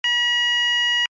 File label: alarm tone, sounder alarm tone; sounder beacon